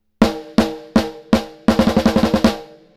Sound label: snare; Valves